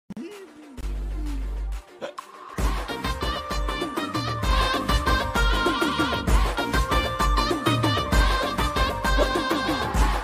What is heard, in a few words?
telugu ringtone